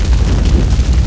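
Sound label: fire ambience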